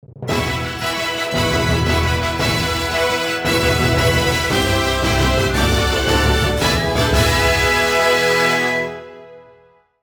Horse Racing Victory; mario sports superstars 2017 3ds gamerip

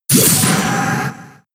Sacred Sword; Pokemon; gen 7; SUMO; USUM

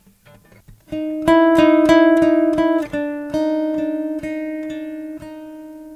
Loop Eerie; carte blanche for a fistful of teeth; windows gamerip 2006

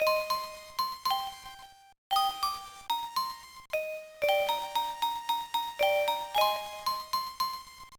Whistle fast; Starshade

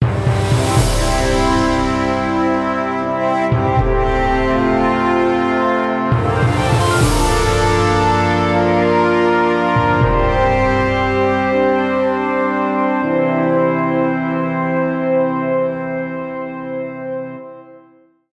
Victory; lucadian chronicles

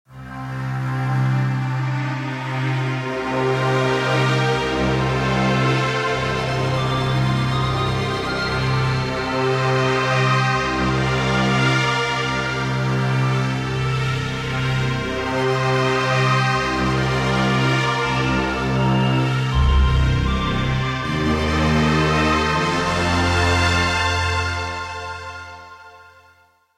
Loading Screen; bejeweled classic

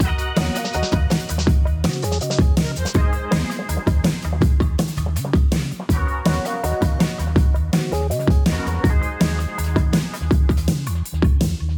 DnB; hot lava; ios macos windows

